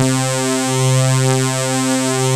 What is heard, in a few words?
JP SYNTHS; Pro-Rec - Synthsonic; JV ANALOG2